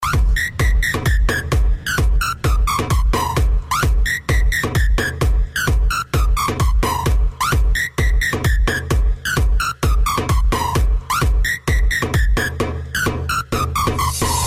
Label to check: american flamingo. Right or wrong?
Wrong